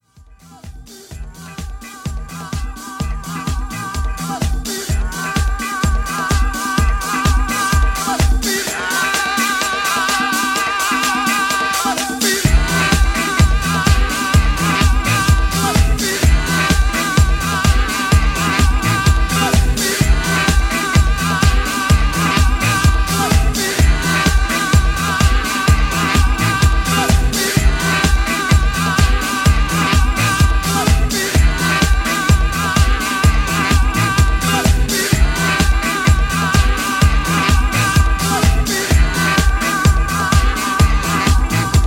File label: Glitter Disco